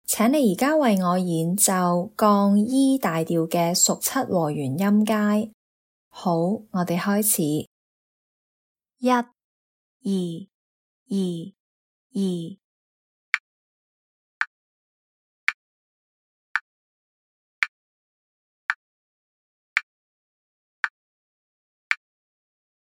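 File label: dominant 7th in the key of E flat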